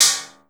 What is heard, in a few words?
PERC -L, Drum & Bass, 180 BPM; Ueberschall; Drum & Bass Resonance